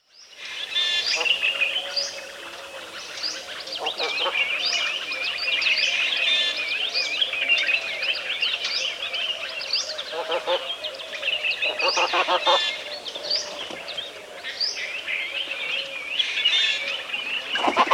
Dry deciduous woodland, midday, winter, Lal Suhanra, Pakistan, many collared doves and insects, other birds in background; Outdoor; BBC